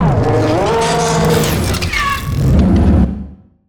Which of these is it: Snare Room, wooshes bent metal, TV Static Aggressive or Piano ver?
wooshes bent metal